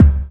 BDRUM; E-Lab; X Static Goldmine 2; akai

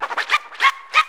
SCRAT, VNL FX; E-Lab; X Static Goldmine 2; akai

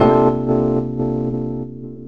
RHODES; E-Lab; X Static Goldmine 2

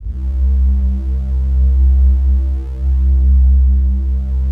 PAD; Best Service; XX Large; Pads

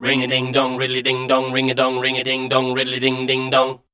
scat, voice

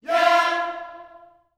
"yeah", gospel vocal, F4; Spectrasonics; Vocal Planet Vol.1 Gospel; akai

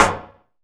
TOM; E-Lab; X Static Goldmine 2

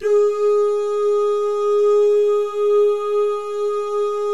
"doo" guys, Vocal Jazz Fx

